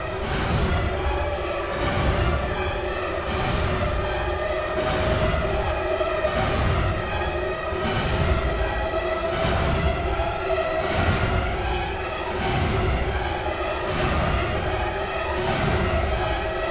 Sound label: Monster Panic; Space Fright